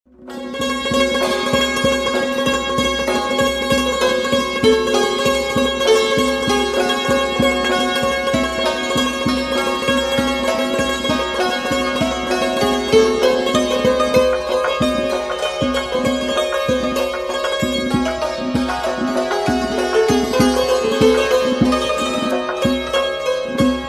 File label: Santoor